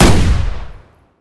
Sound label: fire, explodium gun